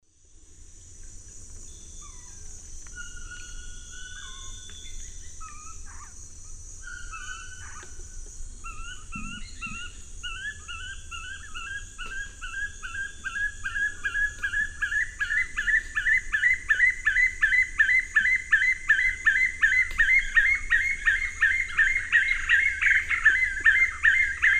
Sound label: Monasa nigrifrons, group song; S. America; Eastern Lowlands